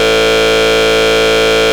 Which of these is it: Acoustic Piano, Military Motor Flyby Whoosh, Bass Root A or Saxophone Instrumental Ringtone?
Bass Root A